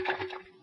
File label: shake end